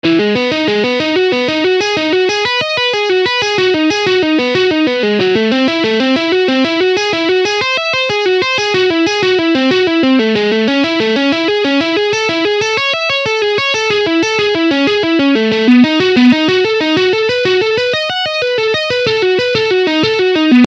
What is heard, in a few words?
Hybrid Picking Exercise